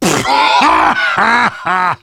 Heavy laugh happy

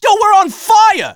positive vocalization; Scout

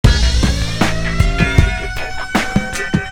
boombox; Scout

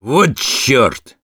negative vocalization; Sniper

